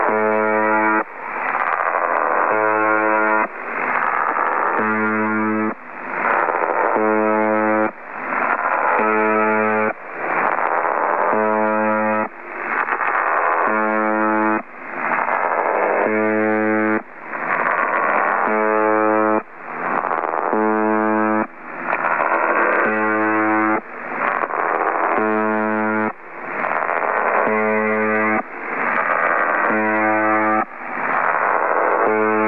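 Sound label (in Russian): зуммер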